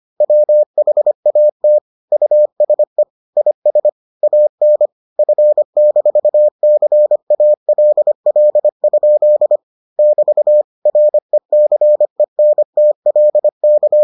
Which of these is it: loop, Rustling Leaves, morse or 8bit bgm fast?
morse